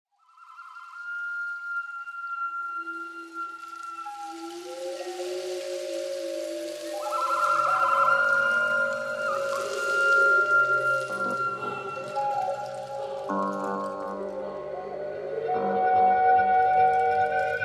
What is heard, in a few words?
Forest Music